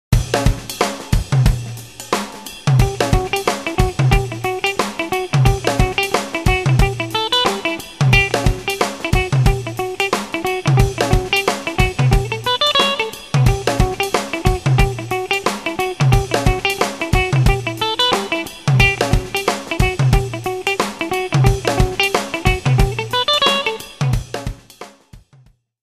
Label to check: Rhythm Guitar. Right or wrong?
Right